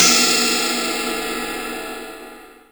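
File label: ride, drumtraks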